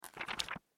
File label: Office Book Hard Cover Pages Turn Flip Through Short